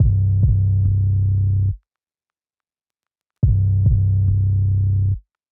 Bass Loop

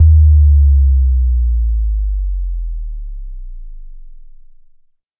synth sub drop

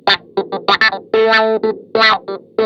Clavinet wah 2 note riff 95 bpm

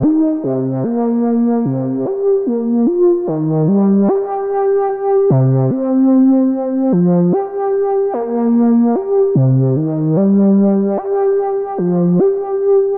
Loop Analog Reels Synth Lead Slide Cm 74

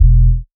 BASS F

